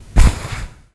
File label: rustle